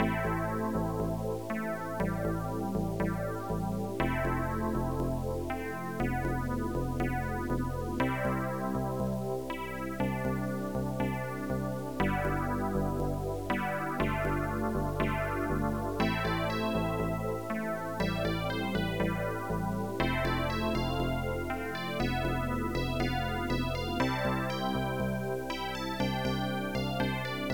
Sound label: rain